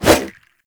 Sword Swipe, Whooshes; Warframe; Weapons; Melee; Pacifist Sword And Board

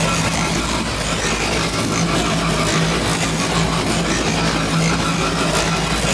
Whirlwind Loop; Warframe; Caliban

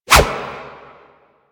Wolf Swipe; Animals; Warframe; Quests; Duviri

